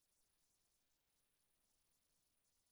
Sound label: Calls Before Echoes